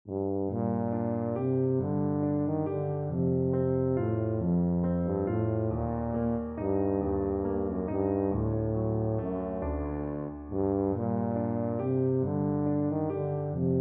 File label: greensleeves, tuba